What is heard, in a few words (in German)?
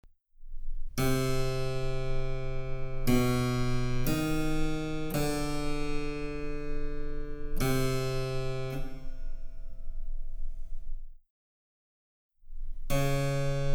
Cembalo; Mark Lindley WTC 1